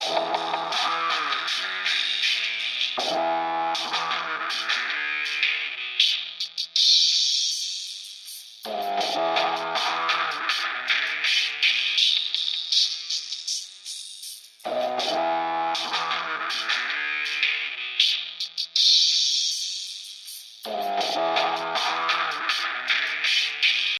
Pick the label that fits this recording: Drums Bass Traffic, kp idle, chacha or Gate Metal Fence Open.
Drums Bass Traffic